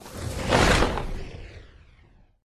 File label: Superhero take off